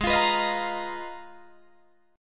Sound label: Ab Minor 6th Add 9th, Ab, Guitar, Drop D